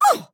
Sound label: Grunt Hit; Realm Royale; Mage; Radon Flux